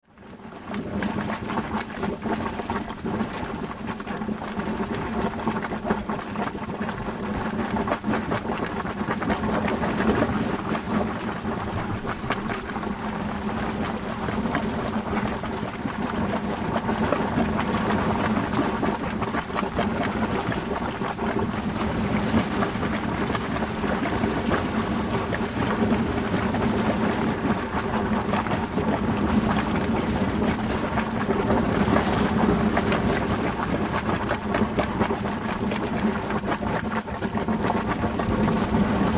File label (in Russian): burlenie gejzera